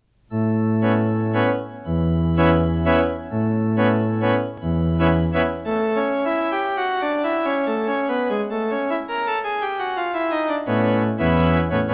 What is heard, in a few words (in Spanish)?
Calesita 6-4, Música para teatro